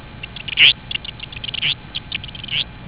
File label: kingbird